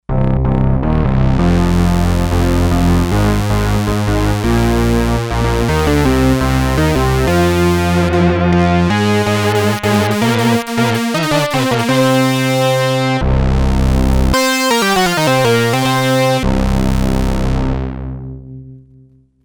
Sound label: Stack example, Sequential Circuits Six-Trak; Stacks